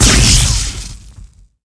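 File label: lighting trap explosion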